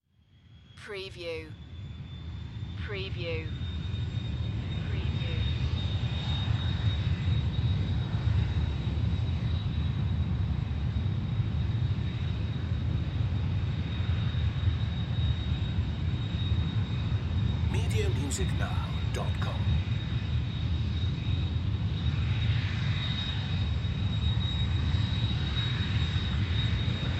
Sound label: Airplane Jet Idle Ambience